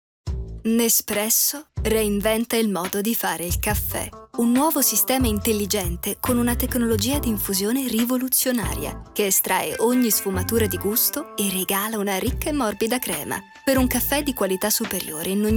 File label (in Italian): musica; nepresso